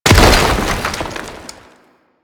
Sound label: Destructible Rock Cover; Objects